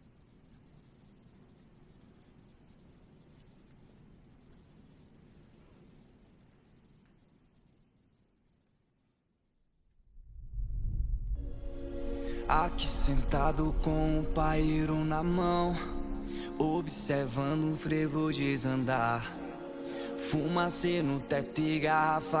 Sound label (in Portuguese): graves bass